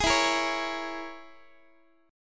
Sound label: E 6th Flat 5th, down, Guitar; E6b5; strummed; x,x,11,14,14,12